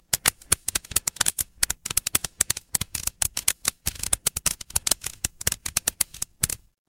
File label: fire electric crackle